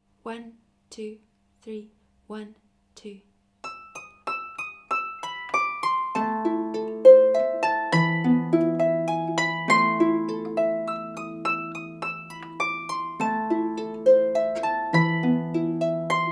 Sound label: Fur Elise Full speed Play through Small Harps